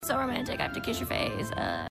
romantic

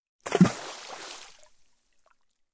deep splash

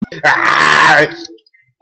aaargh

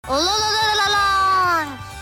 boo

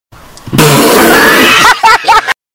hahahah

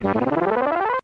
transition sound

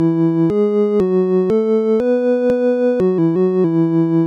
arcade win

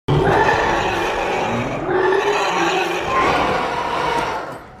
roar; toothless; alpha; httyd 2

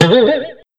goofy spring sound

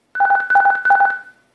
fire tone; chicago fire

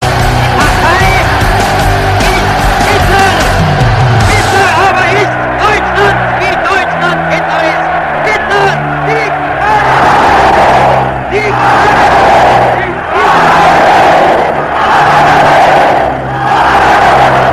ringtone

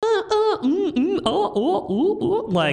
moan alert

